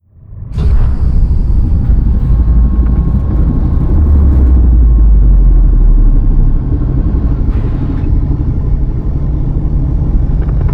mammalian colossus closing; apocalypse

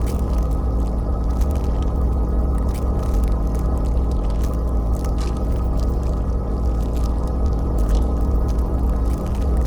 pro ship stinger idle; biogenesis

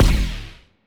mandible hit, weapons; Stellaris; biogenesis

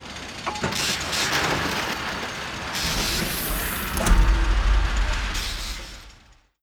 event construction; Stellaris

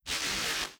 reality breach spark; Stellaris; nemesis

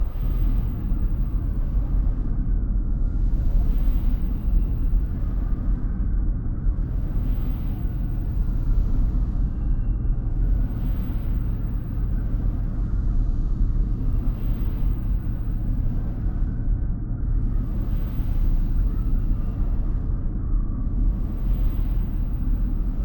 amb starbase mercenary; Stellaris; overlord; Starbases

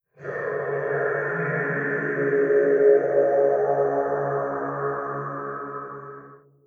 falling star; Stellaris

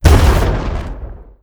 xl arc emitter fire; weapons; Stellaris

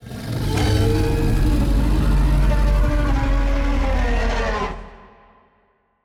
kaiju adult superweapon roar; biogenesis

